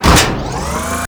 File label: Injection Arm Mover Start; Warframe; Ambience; Grineer Ocean; Ocean Sabotage Injection